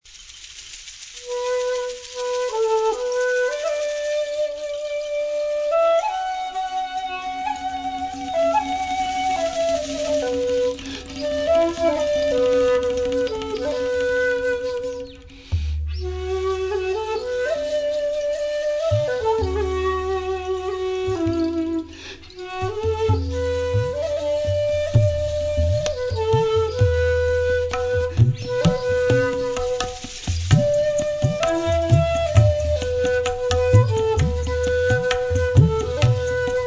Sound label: kokiriku, taiko tabla